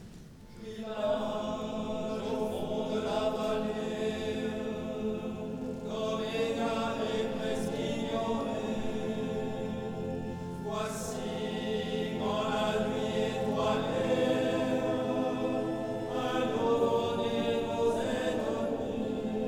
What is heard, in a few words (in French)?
concert; concert st claude